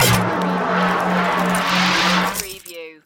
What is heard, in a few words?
Freeze Gun Shoot